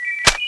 reload, weapons; tfa cso

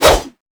swing longblade; arisha

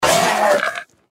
deathclaw death; npc

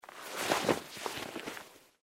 wear

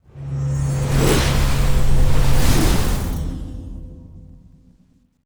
battle spin low; macha

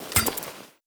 hit, knife; weapons; cw20 knife g4p

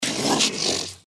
gecko snarl; npc

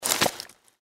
gecko jump; npc

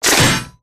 gatling heat steam vent close, apc weapons; gow

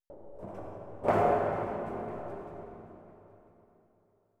metal hit far; soundscapes; occultists

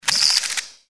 power attack vox; npc; cazadore

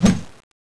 stab miss, crowbar; tfa cso; crowbar craft

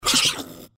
giant rat attack

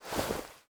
weapon draw

